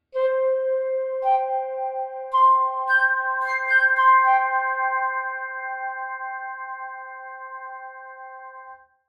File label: Flute Petrof 284M FX